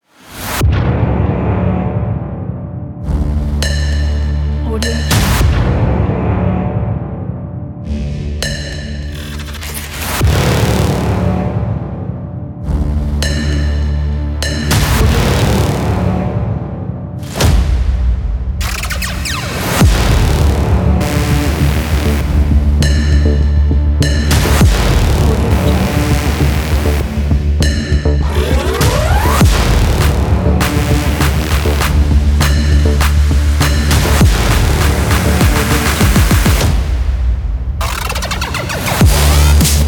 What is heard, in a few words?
dubstep action trailer; marblespace